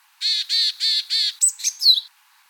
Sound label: novel mixed-species ungrammatical call sequence; reverse order; Japanese Tits